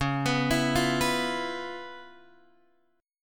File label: Db 7th Sharp 9th Db down Guitar Open G